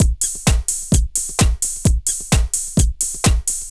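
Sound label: drum loops, left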